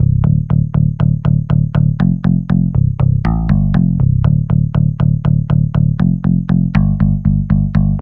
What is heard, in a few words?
Bass; Blockland